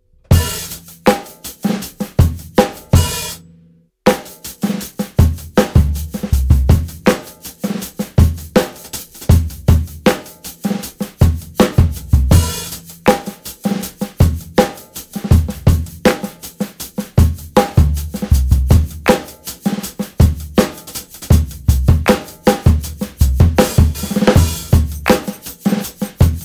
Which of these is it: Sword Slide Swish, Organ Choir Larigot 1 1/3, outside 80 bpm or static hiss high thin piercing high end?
outside 80 bpm